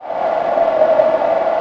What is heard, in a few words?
wind; mario 64